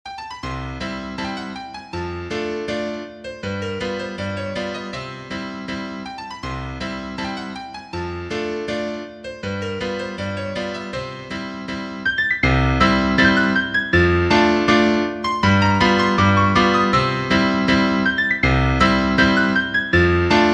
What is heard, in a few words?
piano